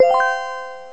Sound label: Winline; Twingo